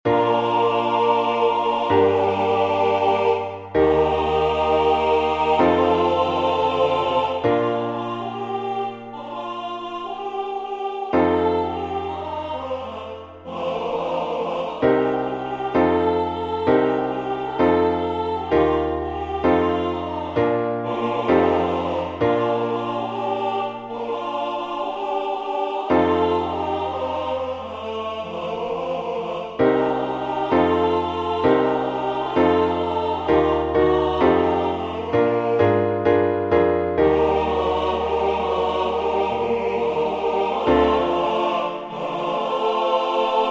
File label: MIDI SATB